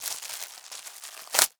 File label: Plastic Wrap, Kitchen